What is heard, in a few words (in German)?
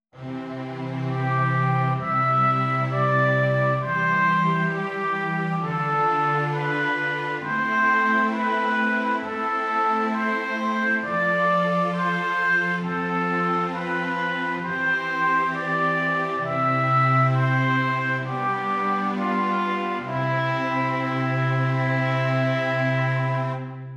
Stimmiger Contrapunkt; Ronge